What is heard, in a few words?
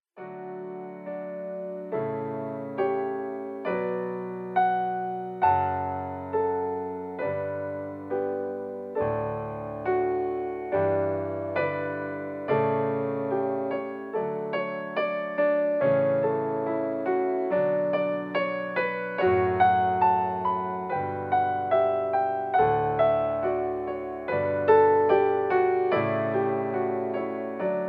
Canon In D, piano